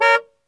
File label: horn, cars